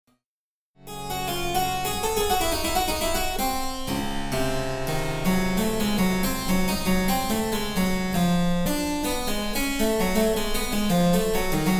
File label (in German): Cembalo; Mark Lindley WTC 1